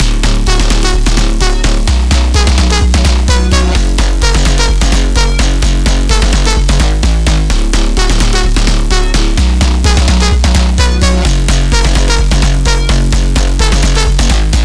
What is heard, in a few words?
128 BPM F# Min, House, Drop Loops; Mothership; Cymatics